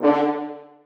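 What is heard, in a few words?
horns staccato c#3; Sonatina Symphonic Orchestra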